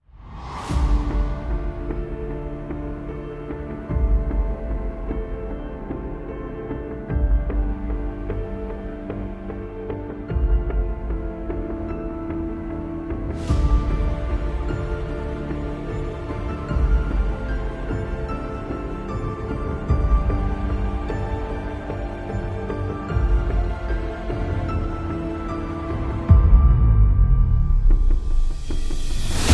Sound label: Emotional Cinematic Universe War